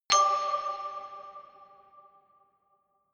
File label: notification glockenspiel creepy fifth